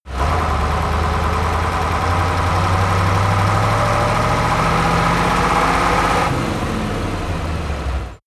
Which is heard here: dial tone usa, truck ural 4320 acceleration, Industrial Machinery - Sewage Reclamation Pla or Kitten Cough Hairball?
truck ural 4320 acceleration